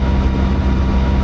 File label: revers; Mafie 1